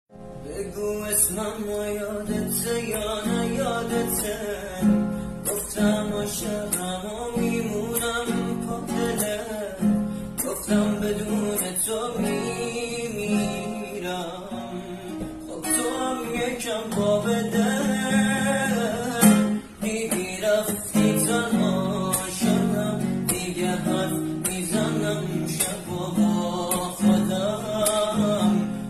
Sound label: Guitar